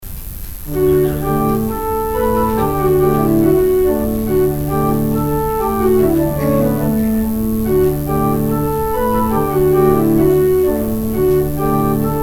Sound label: organ interlude; Interlude during the collection; St. Mungo's